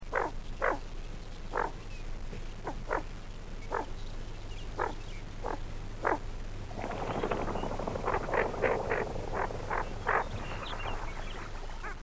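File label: greater scaup voice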